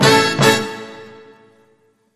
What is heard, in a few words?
Hit